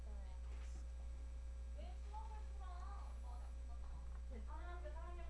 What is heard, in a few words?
horror